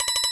big Win Count Up; willyshotchillies

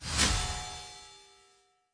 win Attention; finn

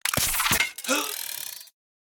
climb Level Anim; steamtower

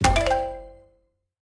explode lion multi; serengetikings

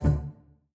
quick Stop, jackhammer; jackhammer 2

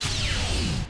near win fail; druids dream

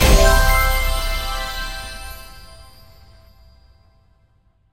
large win; spinsane

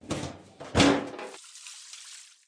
sym Anim Can; jack and beanstalk

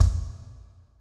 bet Level Change; godsoffortune

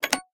button Close; hotline2

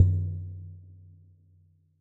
paytable Button; fireflies

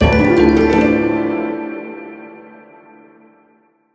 bigWinEnd; secret of the stones

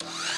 Robot Dance Snd; eldorado

